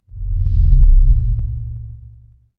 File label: transition sweep